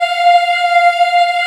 F4 CHILD, Choir; CHILD IHF

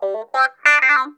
BOX TRILL; Funk Guitar; Zero G - Funk Guitar

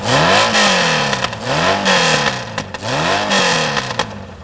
POWER MOT, MOTOS; East West - Global Sounds

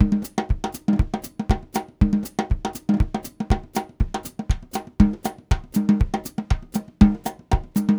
Voodoo Groove, Latin Percussion; Sonic Foundry (Sony Creative Software); Joe Vitale Latin Percussion; Grooves

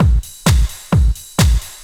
dr t 130 trance 2

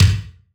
BD, BASS DRUMS; EdgeSounds

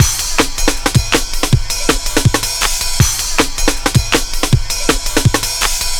160, Drum Loops, Drum & Bass; Total Drum&Bass

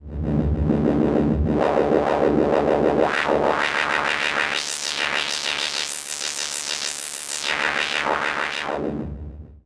StarShip Sounds

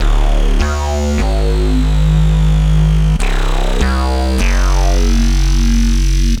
Classic Bass, Drum N Bass; Sonic Foundry (Sony Creative Software); Knutrix Drum & Bass

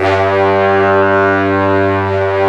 BRASS 2 -L; AKAI S6000 CD-ROM - Ultimate

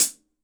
RESO HHC, Hi-Hat

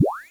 Drums, Hits; DCM8

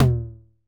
perc classic tom mid

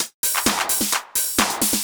130 trance break, Drum & Perc Loops

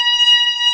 B3 C 4, ORGAN; VOCAL ORGAN

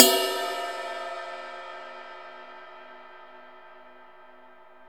Drum Dry; East Collexion; Drum 1

